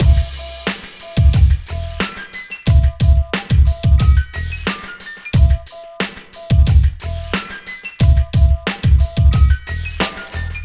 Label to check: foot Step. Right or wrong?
Wrong